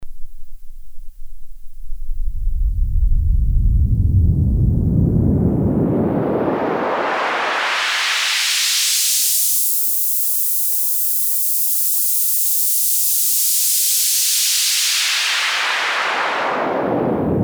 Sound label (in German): Bandpass Rauschen